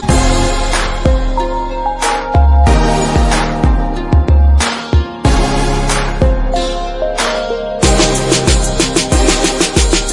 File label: New Music Ringtone; ringtones